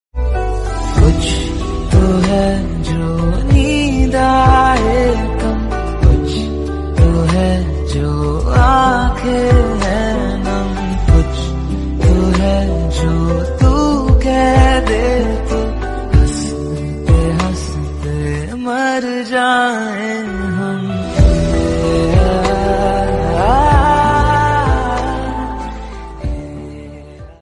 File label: romantic love tone ringtone, bollywood